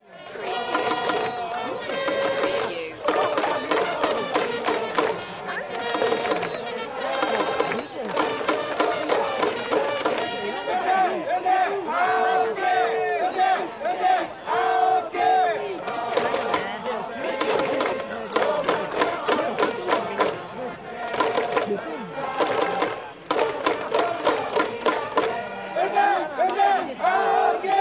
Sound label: Tokyo baseball crowd chant Japan; Swallows; lynne-publishing